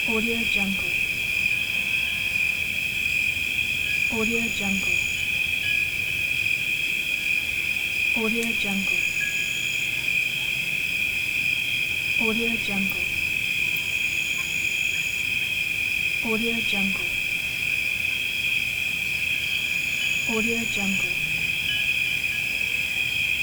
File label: night crickets loop; vlad-korolkov